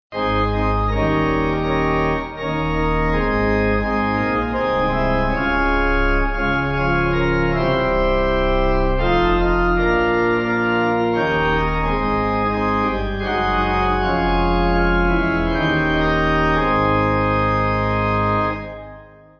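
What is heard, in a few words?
Pipe Organ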